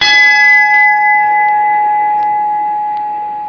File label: bell sounds; tayhem